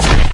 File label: axe